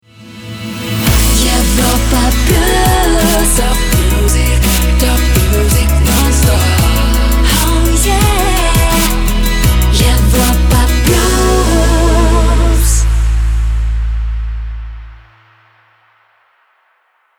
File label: Jingle Main ID; Europa Plus